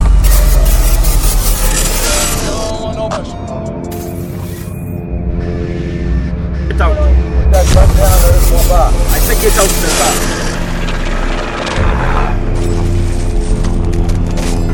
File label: african ringtone